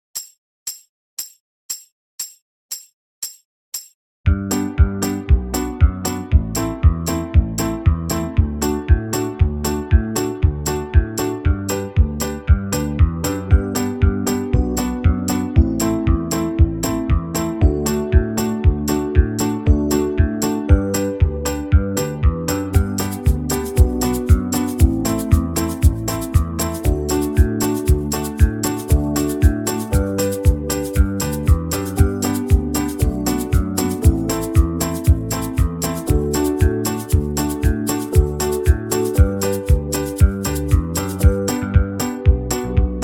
key of g faster, backing track; banjo

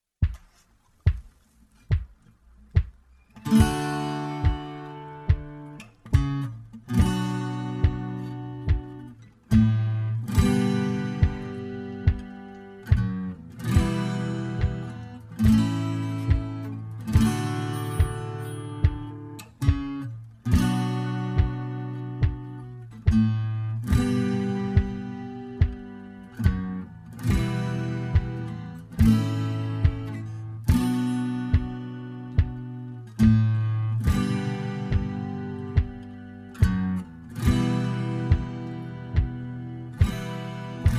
maid behind the bar slower, banjo

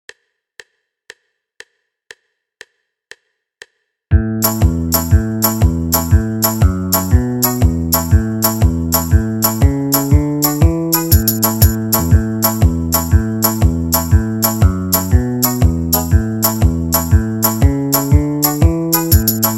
key of a normal, banjo backing track

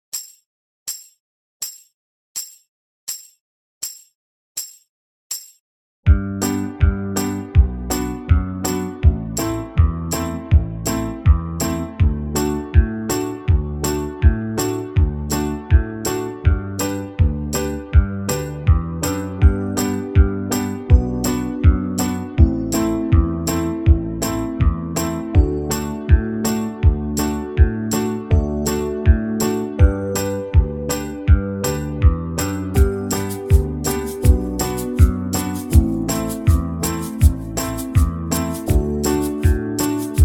key of g very slow, banjo backing tracks

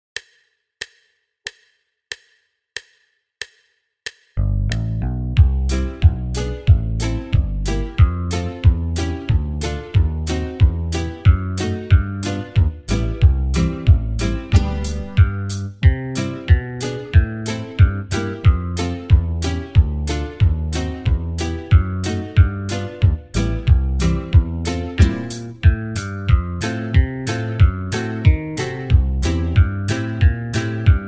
key of g slower, banjo backing tracks

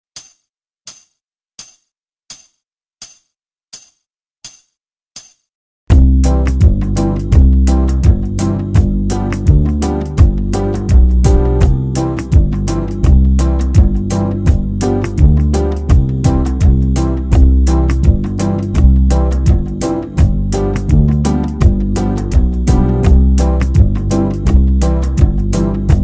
key of c normal, banjo backing tracks

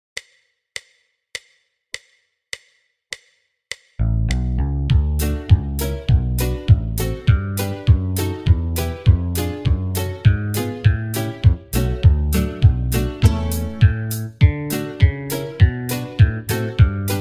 key of a normal, backing track; banjo

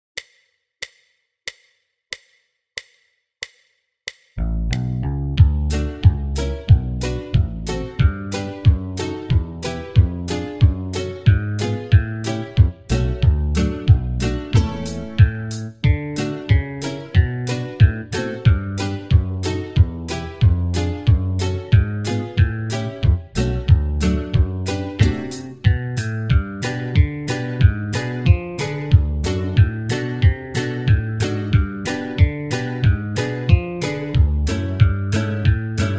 key of a slower, backing track; banjo

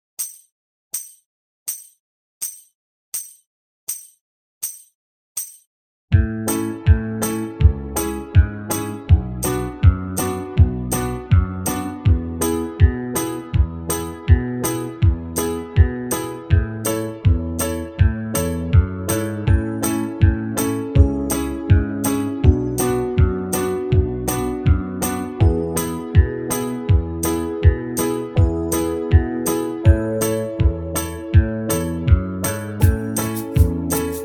key of a very slow, banjo backing tracks

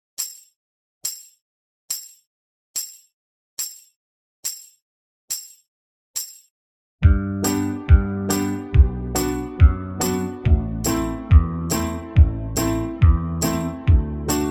key of g woodshed, banjo backing tracks

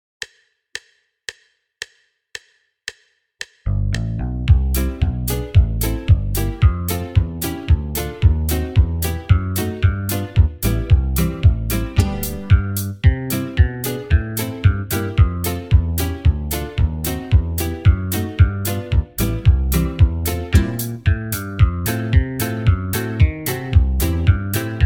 key of g faster, banjo backing tracks

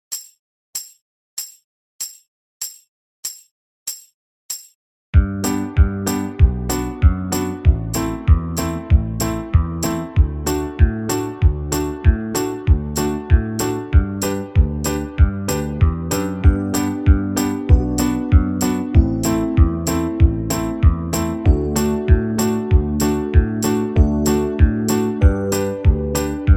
key of g slower, banjo; backing track

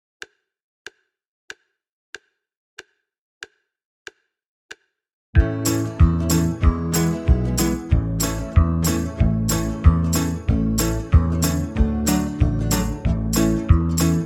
faster, banjo backing tracks

